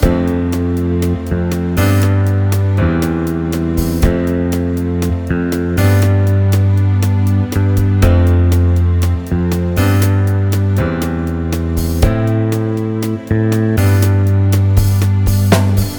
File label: Verse Loop